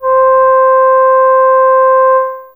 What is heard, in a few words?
Flute 3 C4, Fairlight CMI IIe Wind